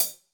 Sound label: HH, Drums; Bob Clearmountain Drums I